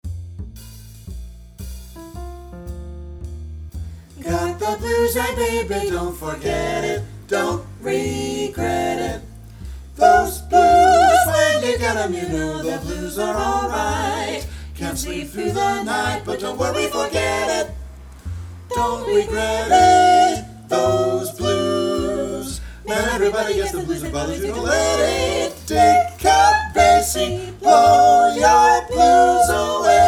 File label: Vocal Blues Etude in F Soprano 2 Missing; NHMEA Jazz All-State Auditions and Festival